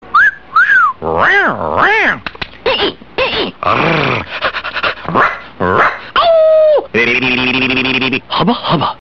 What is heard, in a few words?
cat call